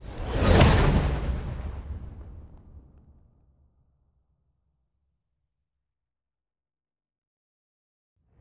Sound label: science ship move; aquatics